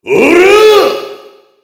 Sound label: heavy meleeing